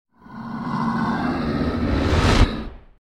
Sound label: Animal stinger - Lowered bull roar, with wind rush; Hollywood Edge Sound Designers Tool Kit